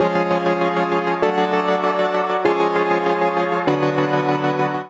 Chords Gb Minor 84 bpm; MIDI Clips; Accompaniment; Ableton; Chop and Swing

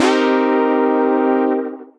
Synth Disco Stab; Synth Keys

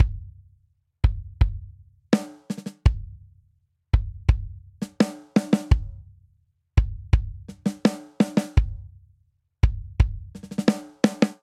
Straight Eighths Rock Intro 84 bpm; MIDI Clips; Ableton; Factory Packs; Drum Booth

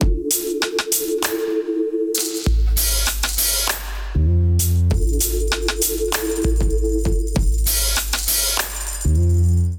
Wah Soul Kit Melodic 98 bpm, Drums; MIDI Clips; Ableton; Chop and Swing

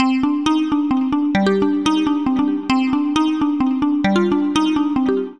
Oldbellz Arp 89 C minor; MIDI Clips; Melodic; Ableton; Factory Packs; Chop and Swing

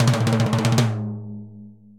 Timbales B L Roll; Latin Percussion; Drum Hits; Ableton; Factory Packs

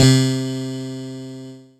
String Breed; Ableton; Factory Packs; Retro Synths; P-50 Linear; P-50 Structures; P-50 Partials

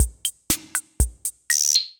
Kit C78, Effected Drum Kits; Drum Machines; Ableton; Factory Packs

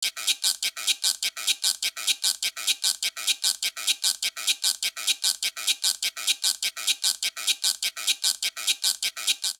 Cumbia Guiro 100bpm; Latin Percussion; Ableton; Factory Packs

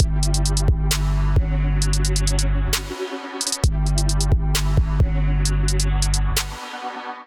kit groove 132 bpm; MIDI Clips; Agile Shot Kit; Ableton; Factory Packs; Beat Tools

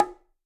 Bongo H Open; Bongo A H Open 3F; Latin Percussion; Drums; Drum Hits; Ableton; Factory Packs

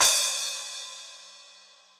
Splash 12 Reso Classic; Cymbal; Drums; Drum Hits; Standard Hits; Ableton; Factory Packs; Session Drums Club

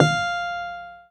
F4, Keyboards; Model-C; Ableton Foundation FreePacks

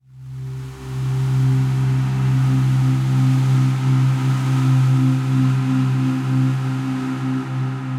Lifebreath, Pad; Instruments; Ableton; Drone Lab

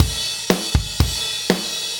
120 Solid Rock Drums; Pop Rock

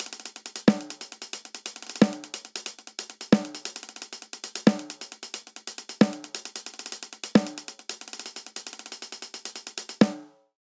Swing Hip Hop Snare & Hihat 90 bpm; 4 4; MIDI Clips; Ableton; Factory Packs; Drum Booth

